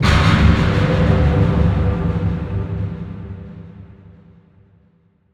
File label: Big Hit